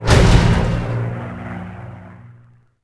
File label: Fighter Hurt; Civ4 warlords